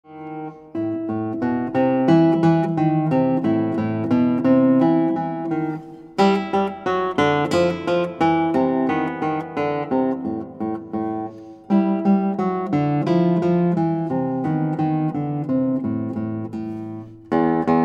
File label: Guitar